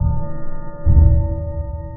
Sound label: sonar Ping Suit Medium Beacon; Barotrauma; Real Sonar